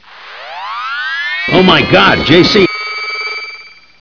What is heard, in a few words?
mine charge, weapons